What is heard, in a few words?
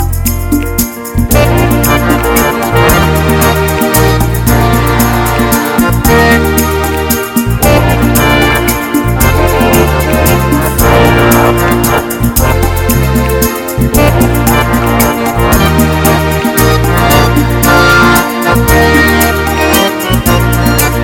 A Great Country